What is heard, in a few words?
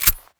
g36c bolt release; weapons